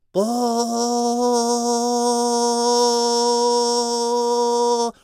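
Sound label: ambience China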